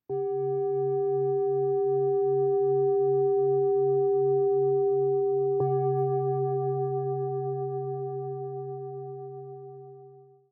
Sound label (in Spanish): Cuenco tibetano sanador de 7 metales con frecuencia OM 136